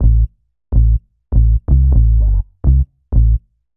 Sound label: DEEPHOUSE BASS 125 A